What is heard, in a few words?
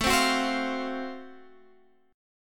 Gb Minor Major 7th Flat 5th, chord